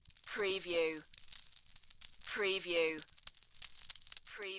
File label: Debris Small